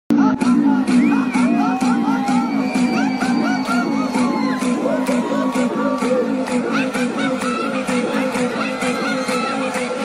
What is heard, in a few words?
Laughing Track Meme Sound Effect